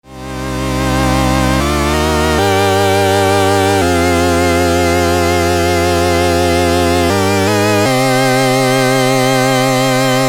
waldorf rocket akkord house

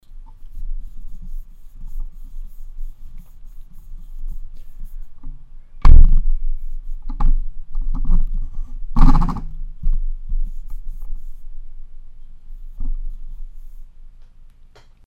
Rode NT USB Handgeräusche

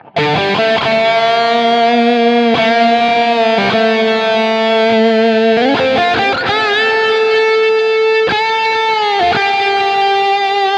Floorboards im Vergleich Lead Referenz S Gear Plug in

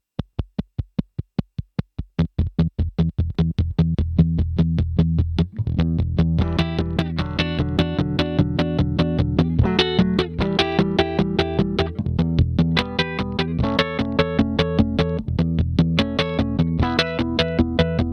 Slicer Beispiel

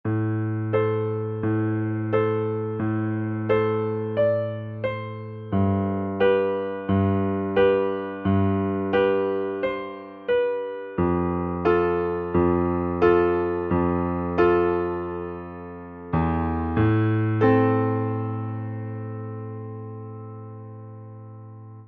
konsonant neunzehnstufig

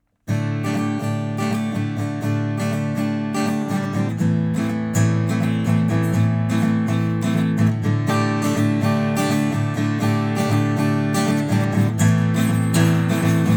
Gitarre; LCT-340

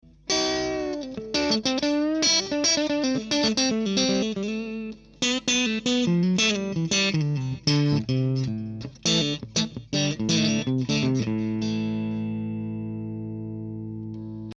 Hals Mitte Crunch

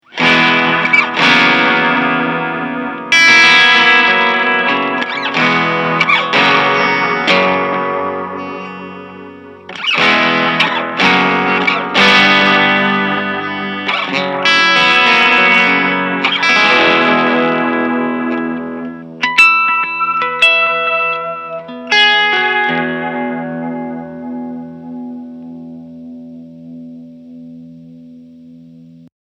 crunch mit mod

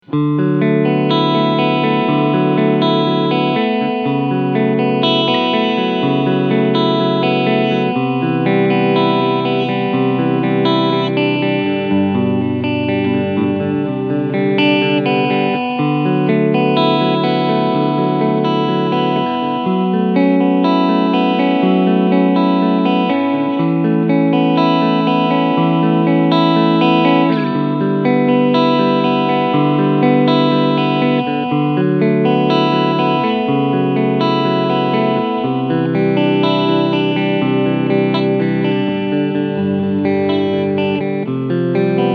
Aeolus SC Modus Clean beide PUs; Harley Benton

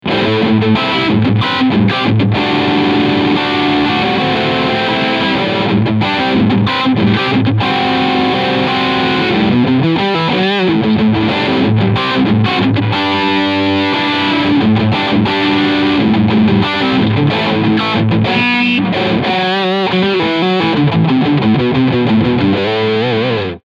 Kramer Guitars Focus VT211S Overdrive Steg Pickup

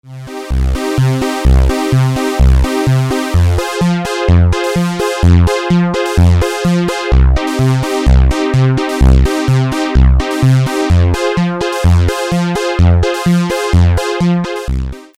waldorf rocket lfo vcf akkord

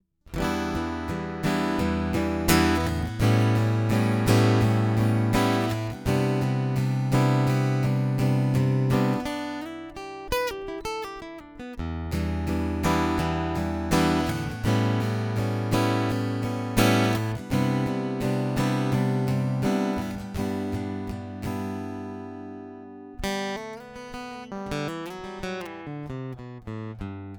Pickup Ton Mittelstellung

